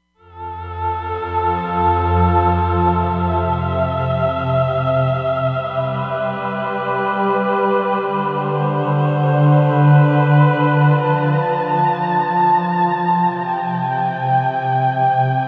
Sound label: Syn Opera, Roland Fantom XR